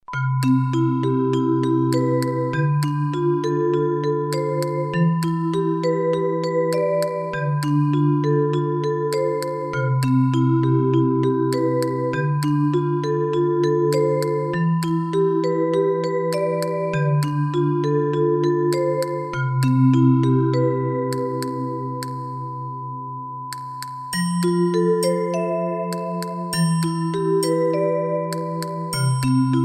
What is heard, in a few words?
MUSIC BOX